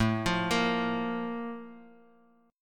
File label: Bb 5th A; Bb5/A arpeggiated